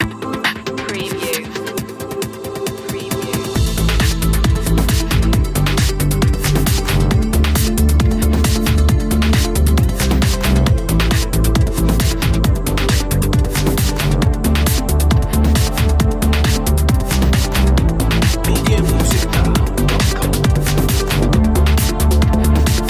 liquid beat

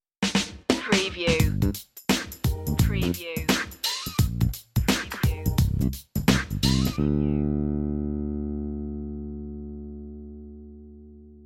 90s hip hop sitcom sting bass drums only

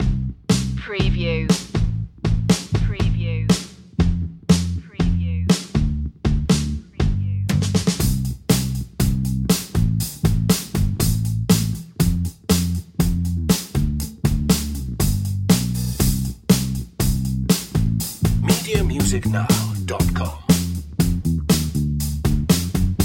joyful pop drums and bass

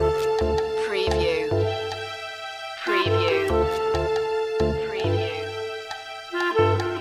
loop

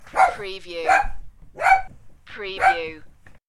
Small Dog Bark

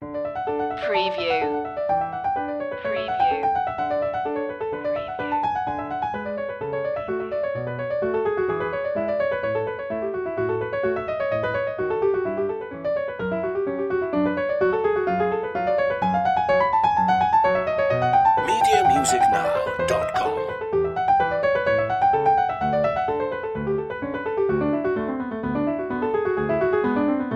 bach prelude in d major; prelude and fugue no 5; bwv 850

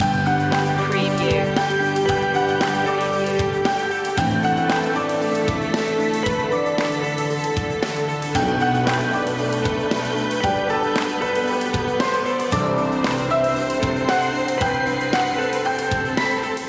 a positive world loop

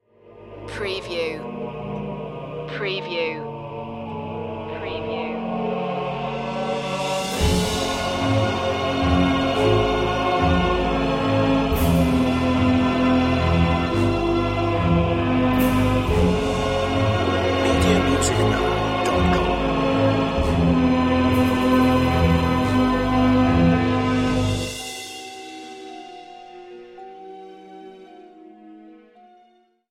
orchestral fantasy

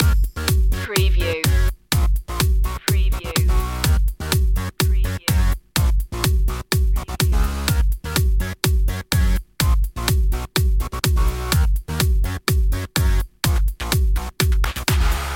pop till we drop loop

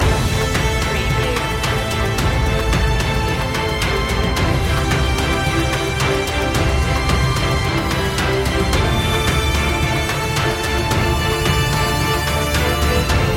victory in the battle loop; media music now

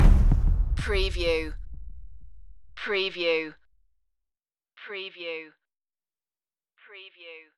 tension loop end

